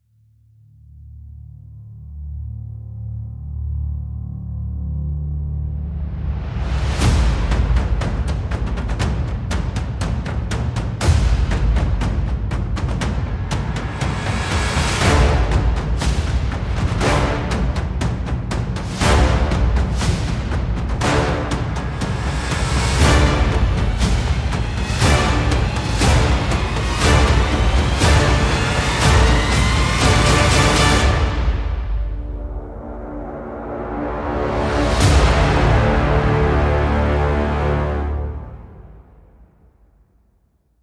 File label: dread build drop